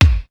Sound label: lo stab; sr16 bd